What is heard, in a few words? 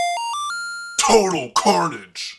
jingle carnage